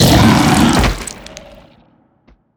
worm death, creatures; Factorio

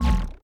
error; Crypt of the NecroDancer

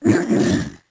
confused; Crypt of the NecroDancer

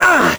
hurt; Crypt of the NecroDancer

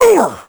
vo hurt; Crypt of the NecroDancer

